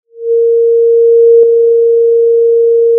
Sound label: pop, Sound of a cruise ship horn